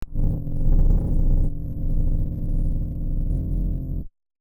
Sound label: Malfunction Synth, malfunctioning synth samples